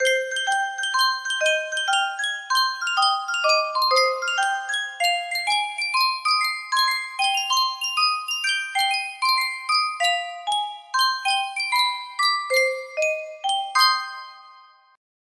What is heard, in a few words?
yunsheng custom tune music box, music box melody; ffx